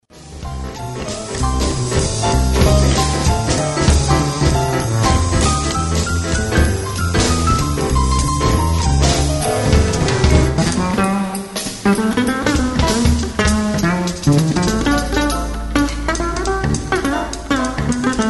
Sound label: Trio